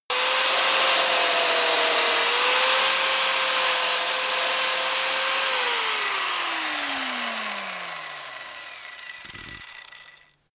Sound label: grinder deep on load stop